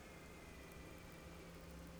pulses, BALAENOPTERA; MONACO 2022-07

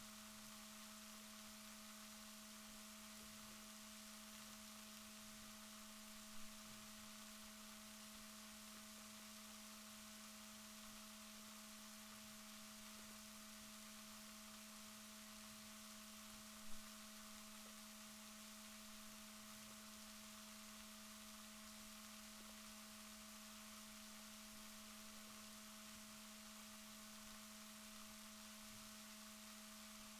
rorquals positif, Balaenoptera Physalus Chile

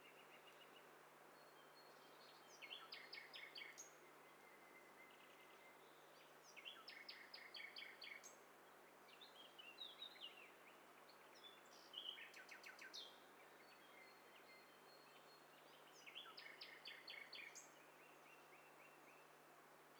STEREO BIRD COCKTAIL PARTY

